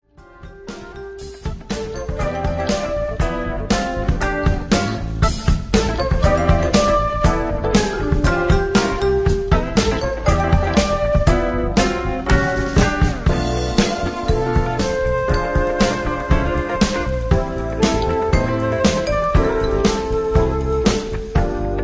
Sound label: Groove